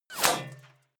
bullet small impact metal

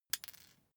paperclip drop floor

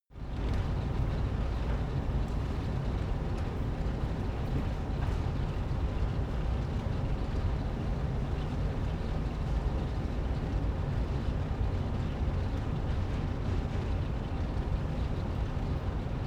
office ventilator

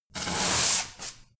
broom sweep close

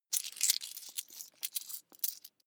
coins clatter medium long

